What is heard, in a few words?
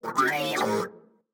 construction robot; factorio 2.0.55